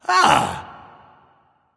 "ah", discover; smite; voicepack; ullr

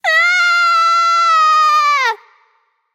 fear; smite; voicepack; scylla

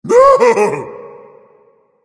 surprised, voice; Smite; ymir skin6

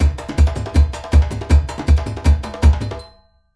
Lobby Victory Beat; smite; kumbhakarna

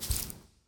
phoenix step; smite; npc

